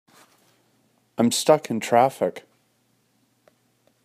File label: Stuck In Traffic